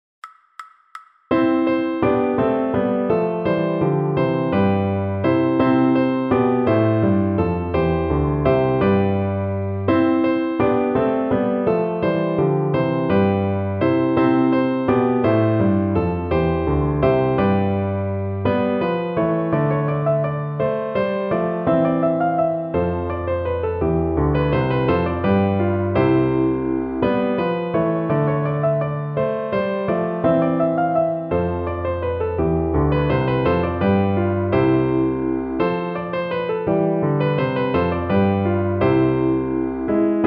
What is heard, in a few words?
Minuet piano, trombone; Corelli